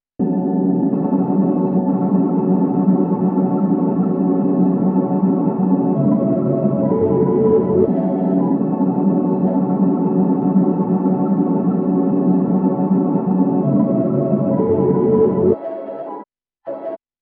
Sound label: Drone Ice Delay; Timeline